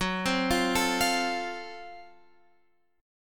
Gb Minor Major 7th Flat 5th, Guitar, Open F, chord; arpeggiated; JGuitar